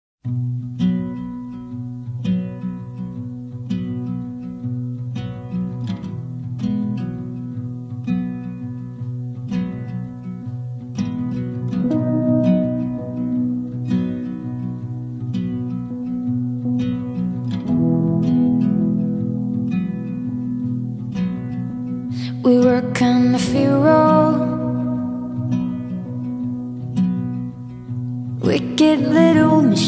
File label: slower great train sfx snare drum